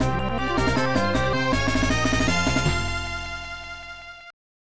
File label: Title, Game Over, & Level Clear Fanfare; Mickey Mania The Timeless Adventures of Mickey Mouse SNES